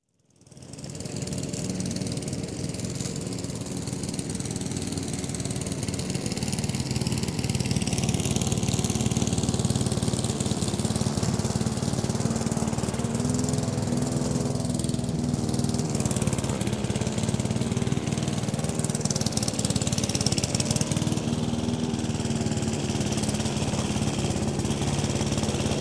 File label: Lawn Mower